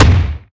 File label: bloodsucker fire